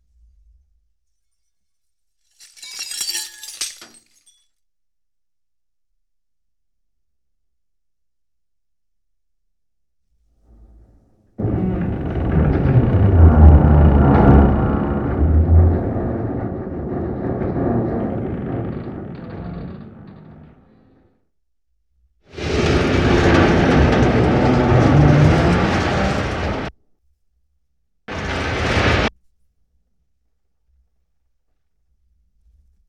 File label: Explosion, 5.1 Surround